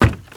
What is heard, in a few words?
STEPS Wood Creaky Run; UltimateFishing2020